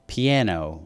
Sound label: piano